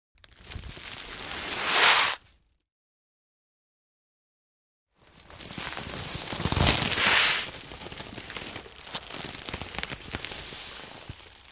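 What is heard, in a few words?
Sand Sack Drag Movement, sandbags; Sand In A Sack, Drag, Movements x3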